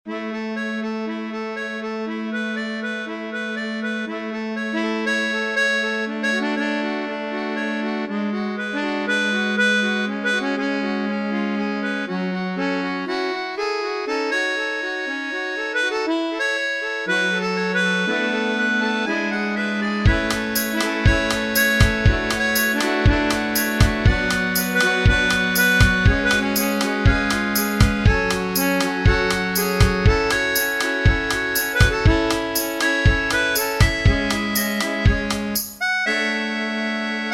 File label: chorus line, melodica